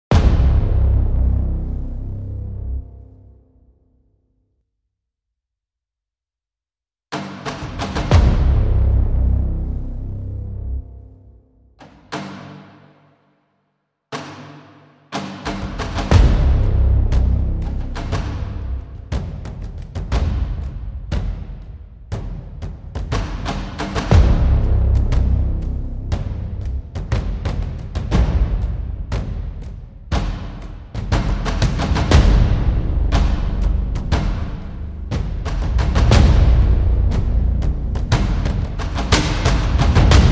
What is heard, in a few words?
Drums and Bass